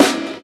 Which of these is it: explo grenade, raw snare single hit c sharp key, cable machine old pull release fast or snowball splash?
raw snare single hit c sharp key